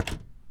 Bang on Shelf; BB Retail Therapy Sample Pack

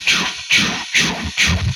Train Loop, Vehicles; retro arcade

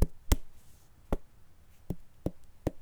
Knock the Melon; Micro Pack; CaptSubtle

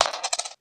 item coins; RPG

